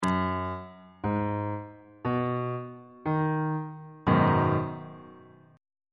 b major 6th 2nd inversion

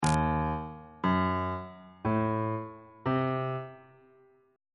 e flat diminished 7th chord intervals

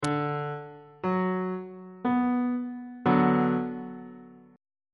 a double sharp minor 1st inversion

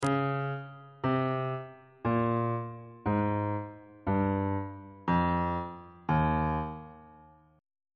e flat mixolydian mode descending

